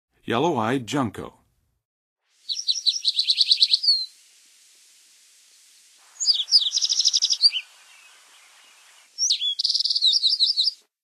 Yellow-eyed Junco, Songs of Birds of North America; Western USA; Western USA Songbirds